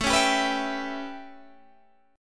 A 9th A, Guitar Open D

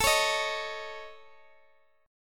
A Major Flat 5th, Guitar, DADGAD

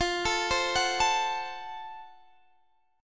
Gb Minor Major 7th Flat 5th, chord

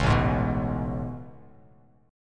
A Major 9th A, Bass Standard

